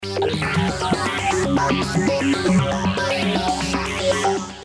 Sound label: arpeggio